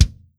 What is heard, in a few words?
kick; hydrogen; drumkits; invAdsrKit